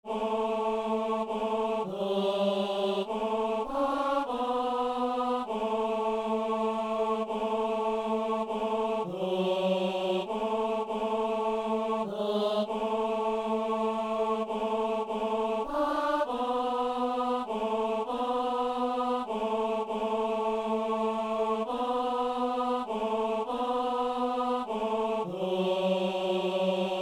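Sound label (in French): messe anamnèse ténor; messe de saint boniface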